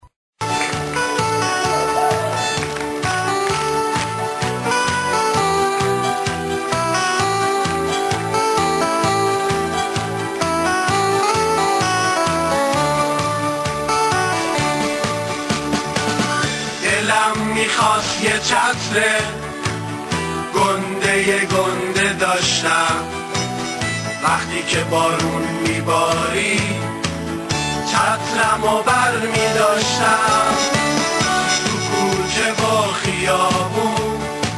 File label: Rain